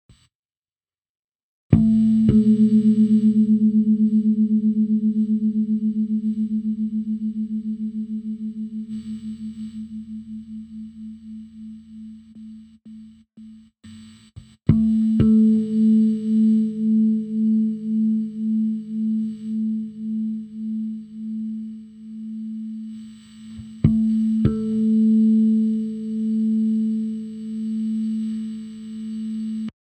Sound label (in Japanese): ハーモニクスチューニング